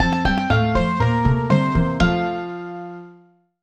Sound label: Game Win; My Friends